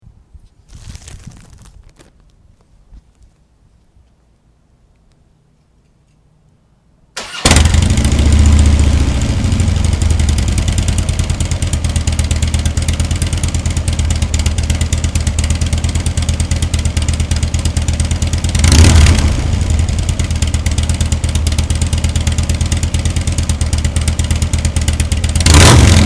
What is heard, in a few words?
mufflers; modifying mufflers; touring models